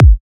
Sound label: chip kick ultimate